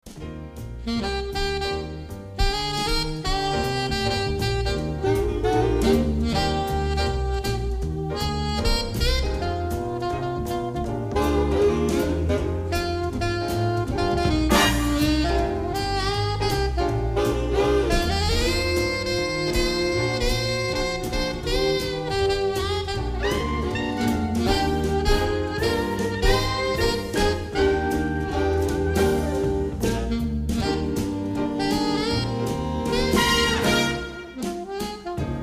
big band

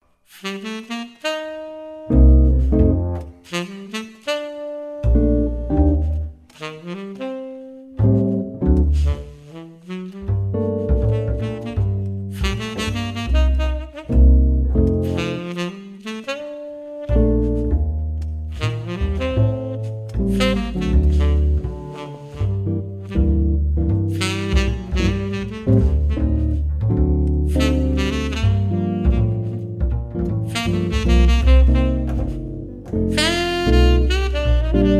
jazz trio

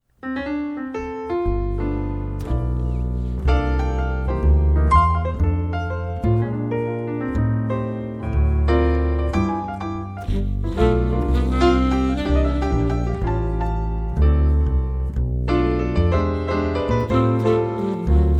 jz trio

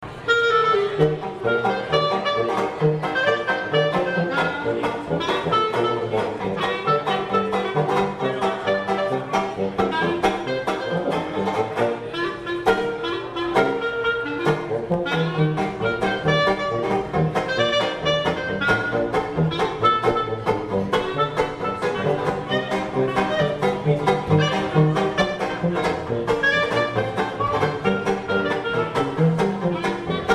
strolling dixie

